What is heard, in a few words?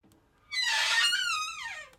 Scary song; haunted house